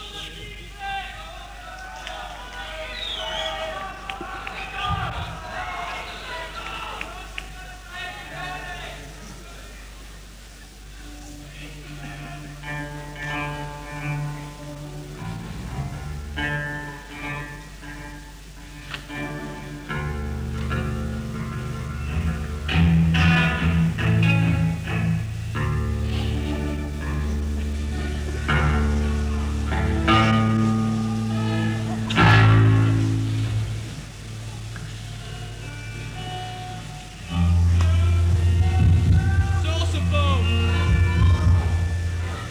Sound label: Tuning & Soundcheck